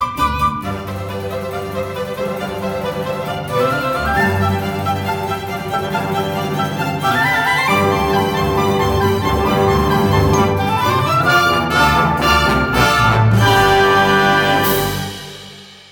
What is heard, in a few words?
accompaniment